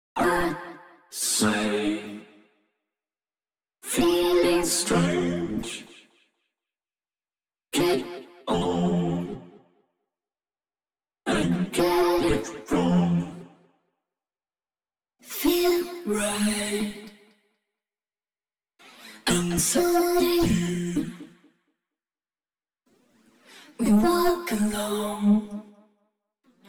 Vocals; multitrack

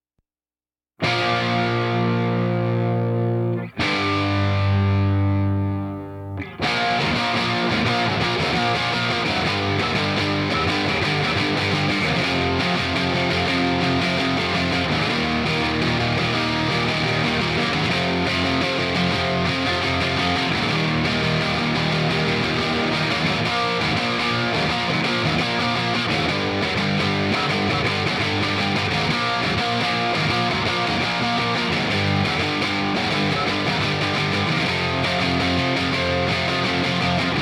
guitar; multitrack

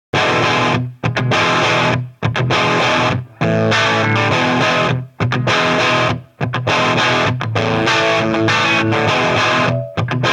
guitar; multitrack

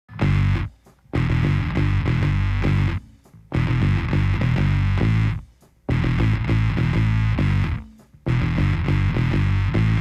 rhythm; multitrack

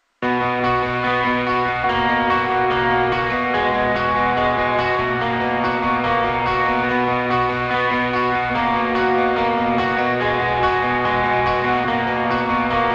guitar; multitrack

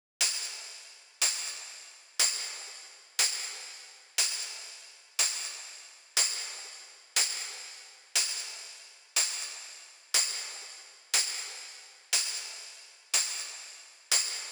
Tambo

